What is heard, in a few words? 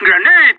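grenade; csgo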